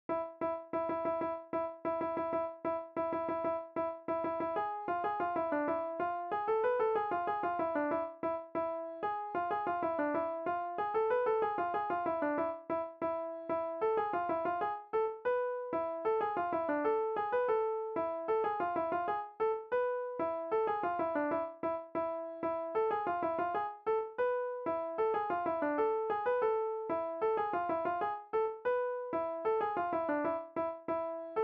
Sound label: melody